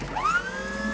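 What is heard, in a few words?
cannon charge start; weapons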